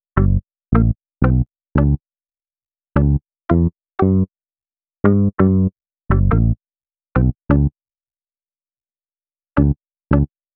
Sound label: Bass, Hip-Hop